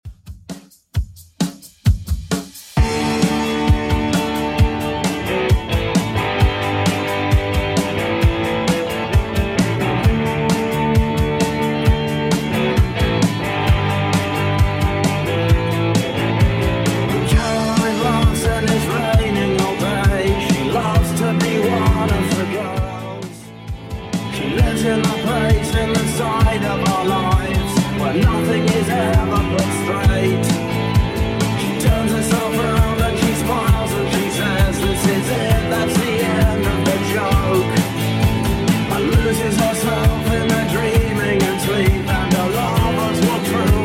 80s 132 bpm; Redrum

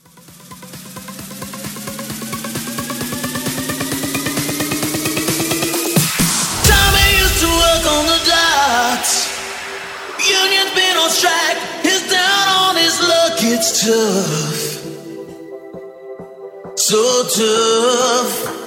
Hybrid Quick Bootleg 132

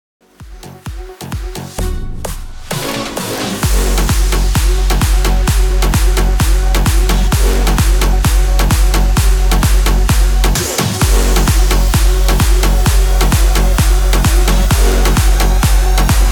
Mashup 130 BPM Clean

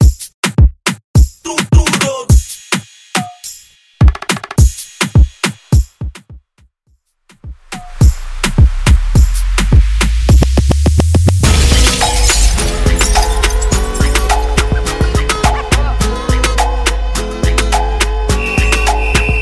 90s Old School

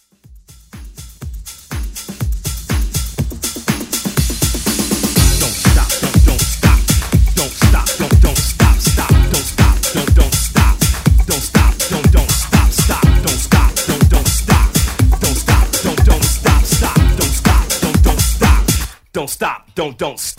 old school redrum

